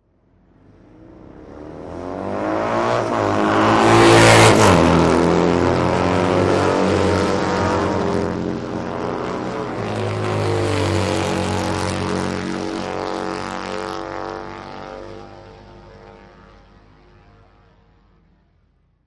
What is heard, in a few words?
Motorcycle Road Racing